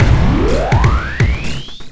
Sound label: Processed Beat, Drums & Percussion